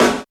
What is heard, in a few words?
short snare drum b key